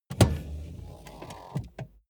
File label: car bmw e46 interior seat push back short